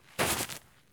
stop grass low; Escape from Tarkov

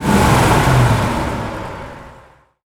Stryker Engine Acc End; DCS

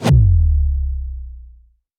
damage hits explosions, Warships

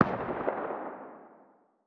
shotgun trap fire distant; Rust

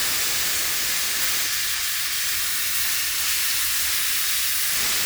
steam pipe burst loop; Explosions

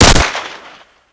mini uzi; Modern Warfare

